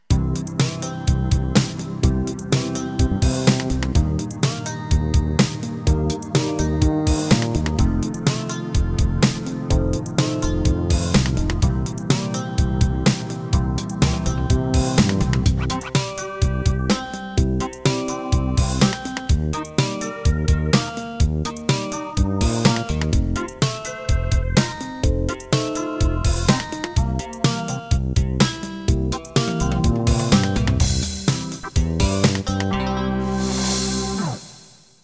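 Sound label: Hip Hop Soul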